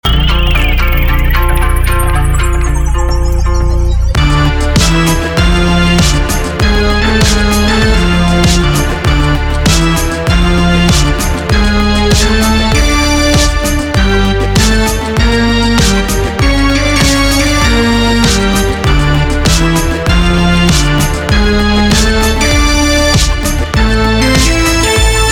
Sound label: Crime Blues